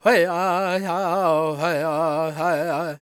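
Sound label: E minor, World Voices, Lakota; Spectrasonics Vocal Planet CD5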